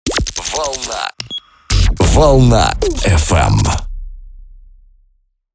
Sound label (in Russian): джинглы для DJ (радио шоу)